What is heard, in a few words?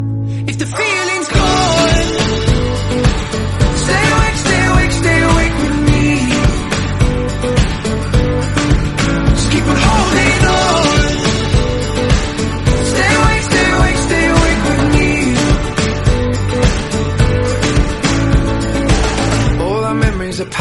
lovely song tone ringtone, English